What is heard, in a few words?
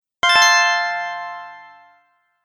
Sound Bright Notification, Beep Sound Effects